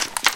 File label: weapon footstep, metal; physics; Garry's Mod; hl2